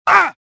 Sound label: pain